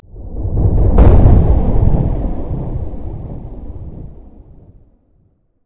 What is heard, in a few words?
explosion